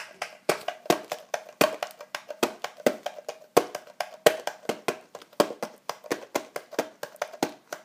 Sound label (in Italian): tamburo